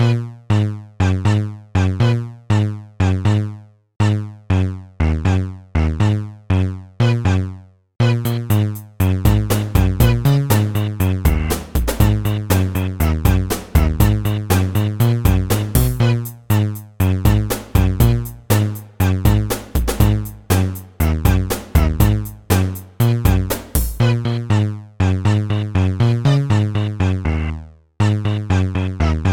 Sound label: Melo LOOP